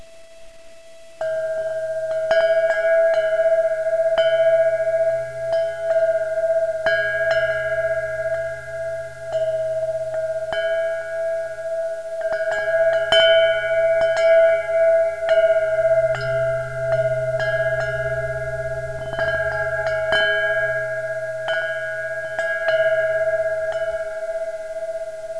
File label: freedom rings bell